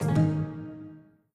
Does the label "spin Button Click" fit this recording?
Yes